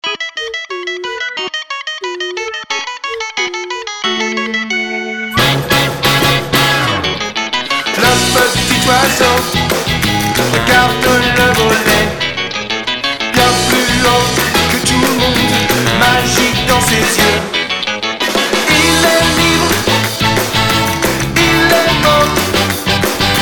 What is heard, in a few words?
Vocal, Music